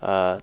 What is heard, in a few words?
"uh", different